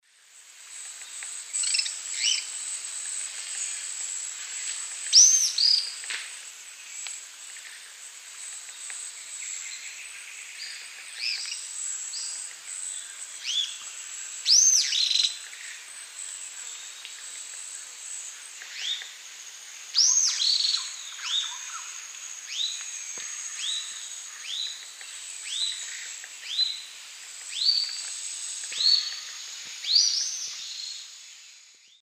Tolmomyias traylori, song and calls pair; S. America; Eastern Lowlands

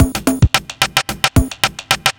block rock kit

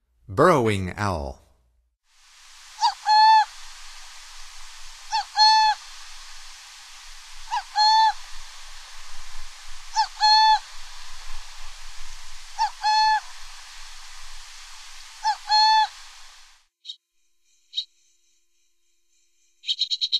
Burrowing Owl; North America; Stokes

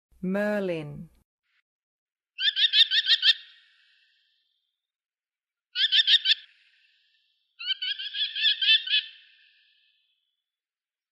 Merlin, Songs of Birds of North America

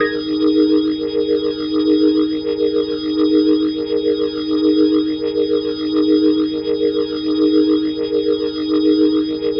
Glowing Organ D# Sus; Ambient Cinematic; Organ Licks

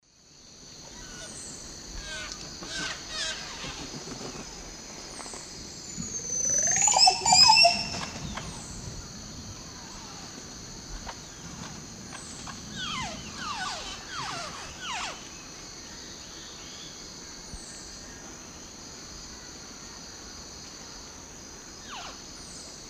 Psarocolius viridis, songs and calls group; S. America; Eastern Lowlands

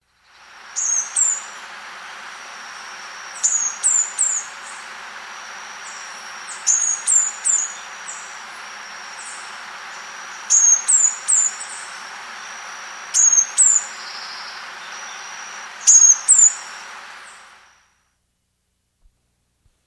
Sooty-Faced Finch, S. America

